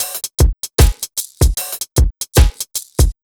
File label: BT, Ragga & Dub Reggae; USB Soundscan vol.27; AKAI